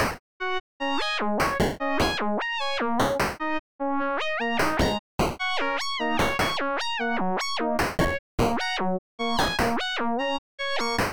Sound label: happy robot